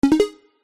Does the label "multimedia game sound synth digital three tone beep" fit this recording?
Yes